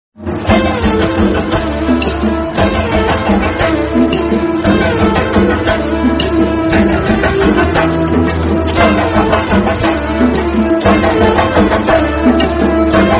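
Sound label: kerala drums remix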